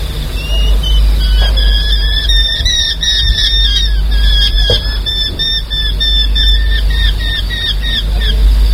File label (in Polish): duet kobuzów